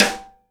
SIDE STICK, Live Snares

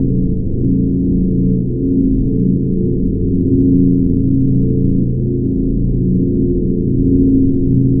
Drone Ambient; Drums & Drones

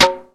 Perc 2; Roland MV8800; SP-8800

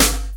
D3H-SNR; Zero G; Man Machine

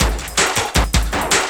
Drum & Bass, 160 BPM

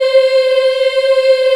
C4 child, Choir; CHILD IHF

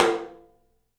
open tom; 3D Sound; 3D Drums

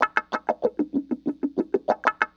WAH PATTE, Funk Gtr & Wah; PlatinumPhattCD2; E-MU Producer Series Vol. 8 – Platinum Phatt

